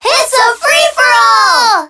Alarm Clock

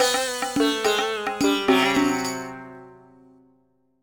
Sandstorm Steps Lose, Losing a level

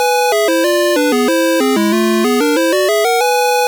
Jingle; Stage 17

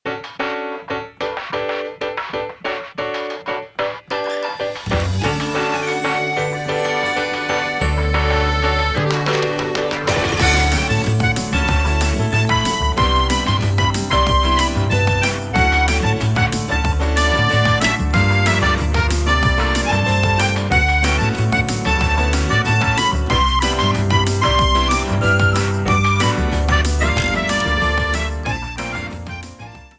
Daisy Circuit Electro Arrangement

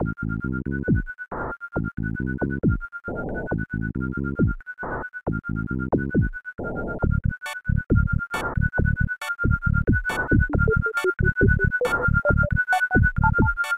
Mixed Match Theme

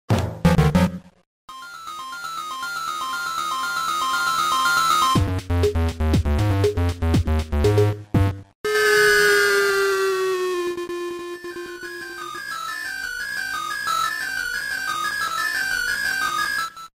game over; Dr. Wario

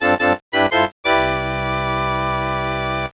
Course Clear 1-Up; SMA4

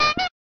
Erase Clear; Mario Paint

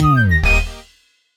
Gift Silver Fanfare; SM3DL

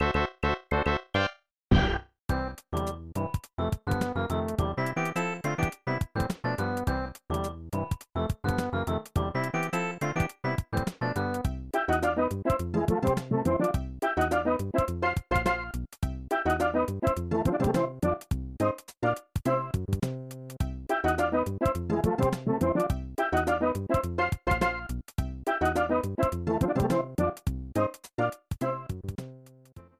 Overworld theme; SMAS; SMB

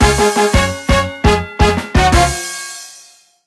Mini-Game Win

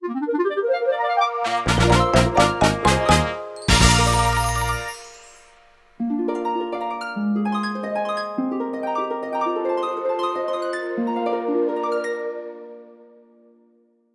WIN BOSS BIG, The jingle

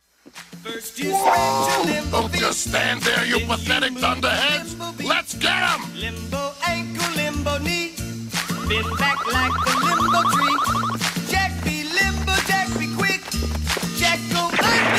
Short music sample of a cover song

